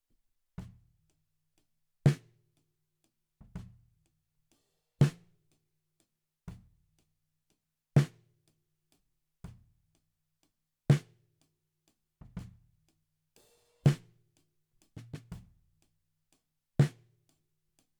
SNR Top

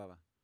Voc

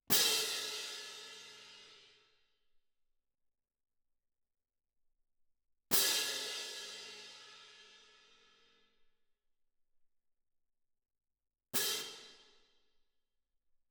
Perc

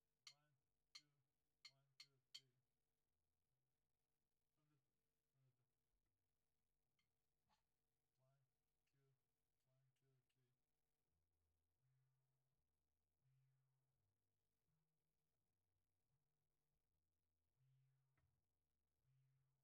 Kick IN

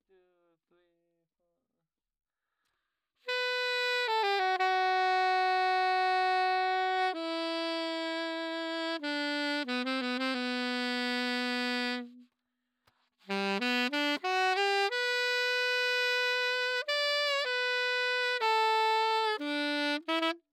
Sax

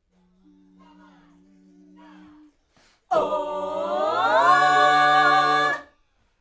Backs AKG

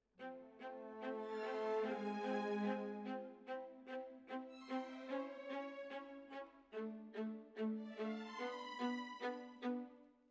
Violas, jazz for piano

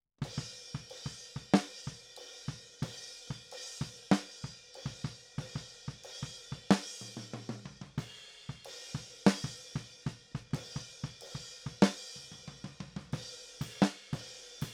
Snare

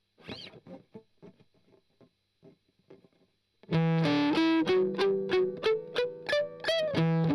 Strum Octav Arp

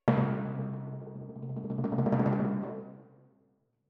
Twin F Timp

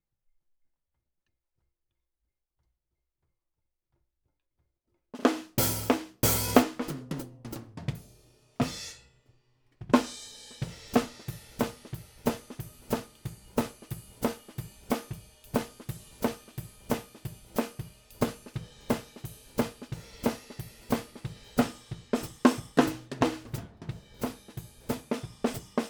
Hat

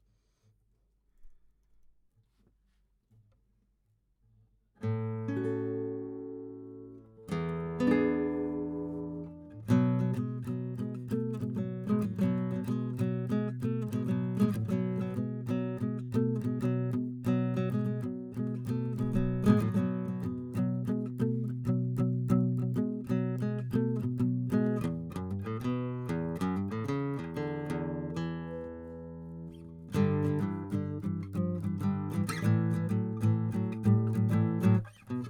GTR

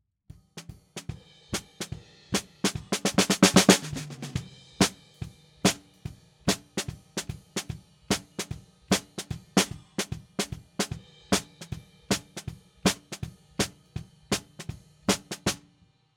SNR BTM

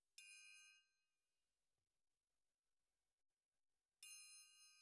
TRIANGLE CLOSE

